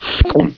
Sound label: Gulp; PC; MARIOS FUNDAMENTALS; Yoshi